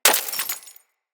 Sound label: Bullet Impact Debris Glass; Weapons